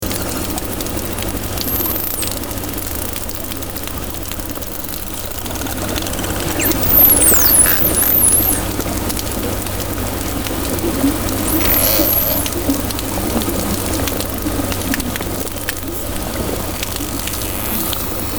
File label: dolphins